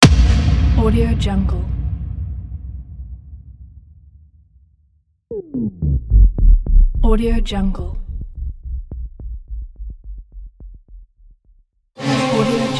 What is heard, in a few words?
cinematic hybrid hits